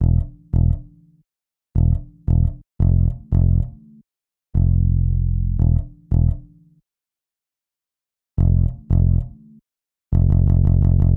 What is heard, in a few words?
Bass, Hip Hop Exotica, 086 Emin; Apple Loops; Big Fish Audio